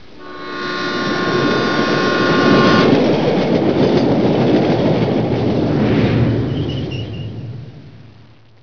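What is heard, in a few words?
train and whistle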